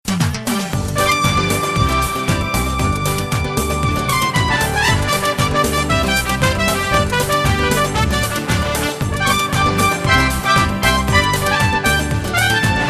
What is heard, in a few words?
Samba Forever